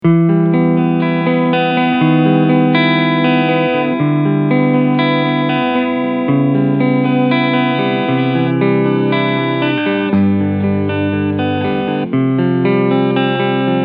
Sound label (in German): Framus Artist Line Alle Cleansounds